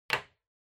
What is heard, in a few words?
Lighter Put